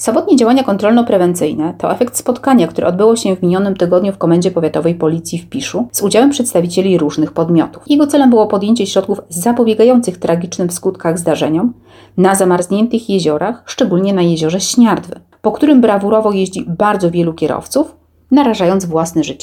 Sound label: funk